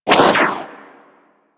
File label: Universe Ring Bounce; Warframe; Warframes; Nezha